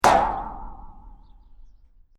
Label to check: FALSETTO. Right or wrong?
Wrong